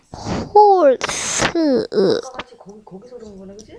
horse

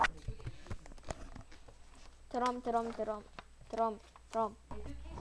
drum

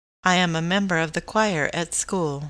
choir

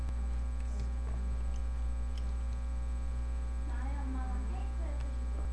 wheeze

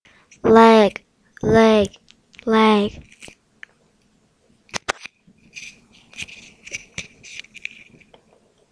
laugh

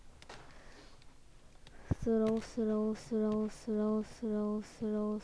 throw

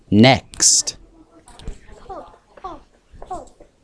pipe

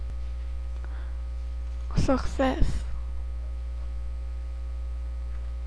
success

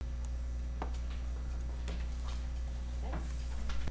meow

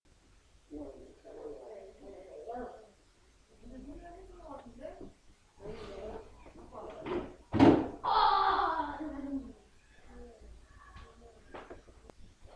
throw

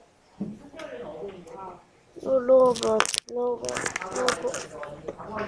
robot

